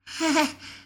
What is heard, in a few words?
haha